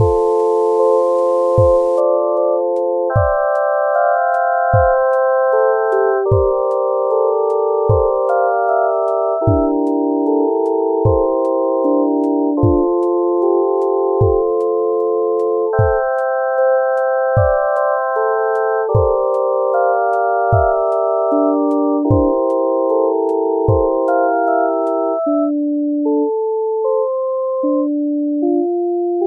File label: lofi